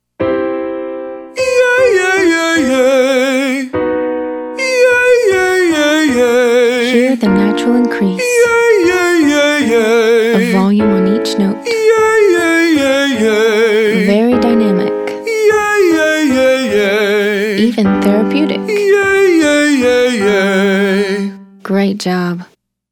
Exercise 3 Yeah Yeah male; Singing

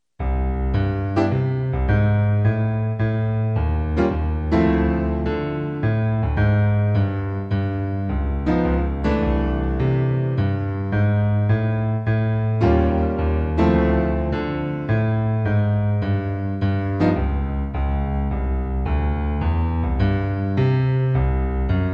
Improv chords Solo

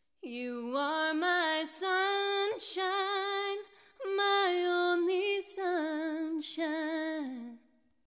female dynamics in country

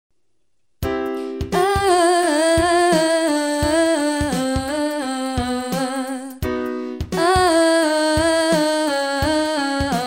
Triplets down 5 tone scale

